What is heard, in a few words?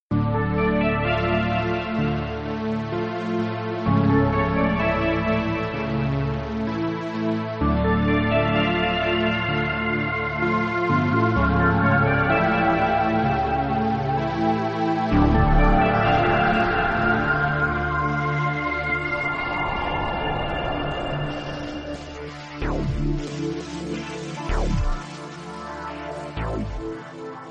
romantic